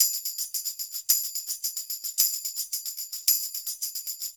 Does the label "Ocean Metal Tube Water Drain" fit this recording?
No